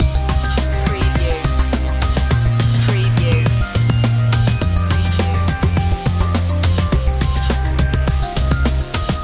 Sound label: ambient breaks loop